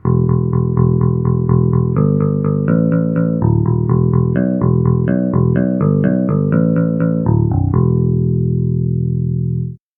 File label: clean guitar bass